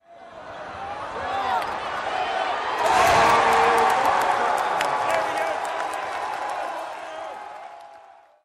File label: Roar, basketball student section; uclas